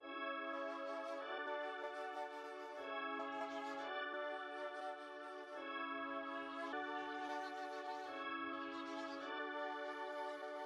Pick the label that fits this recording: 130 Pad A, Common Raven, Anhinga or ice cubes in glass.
130 Pad A